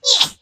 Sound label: littlehorn grunt